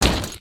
tin, human step

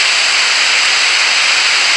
steam; jupiter

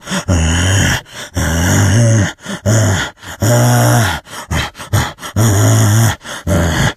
zombie idle; monsters

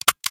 pistol empty; weapons

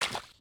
step water

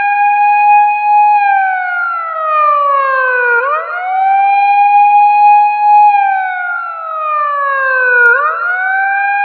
siren

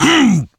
pain

